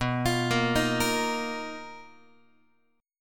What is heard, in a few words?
B Major 7th Flat 5th B down Guitar; arpeggiated